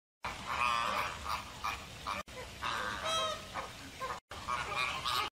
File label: Flamingo Sound; ProSounds